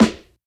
dark sounding snare A key

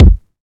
phat kickdrum sound d key

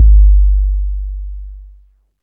big room bass drum single shot g key; Duration 0:02; Samplerate 44100 Hz; Bitdepth 24; kick drum samples

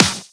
clean steel snare drum sample g key; Duration 0:01; Samplerate 44100 Hz; Bitdepth 24; snare drum samples

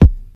smooth kick drum one shot b key

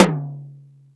hi tom drum one shot d key

